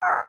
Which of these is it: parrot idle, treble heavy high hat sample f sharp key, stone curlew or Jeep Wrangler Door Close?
parrot idle